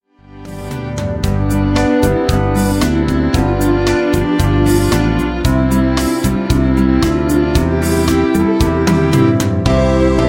Intromusik

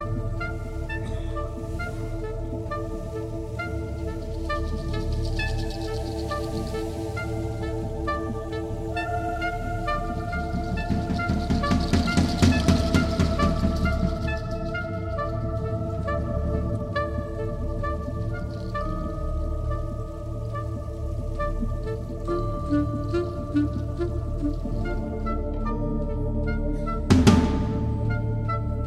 Gebets-Improvisation

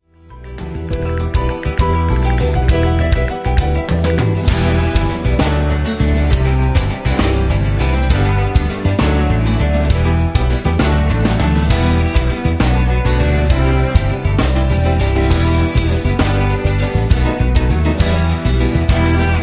Hirtensong Playback

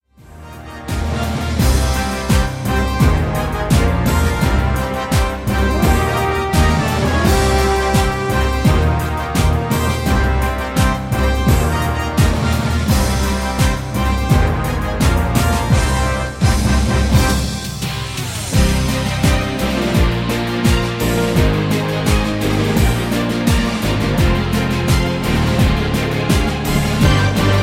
Man hoerte sie marschieren PLAYBACK

